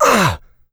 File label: pain light, player; Doom